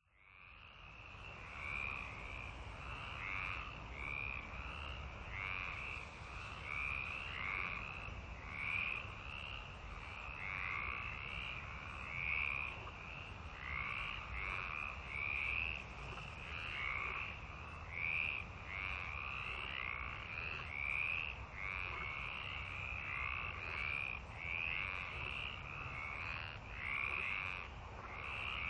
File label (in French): grenouilles Binaural